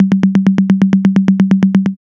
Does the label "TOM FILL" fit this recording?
Yes